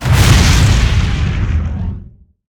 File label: Fireball Lava Launch, Weapons